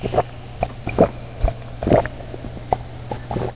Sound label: drink